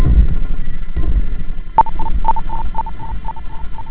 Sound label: spook beat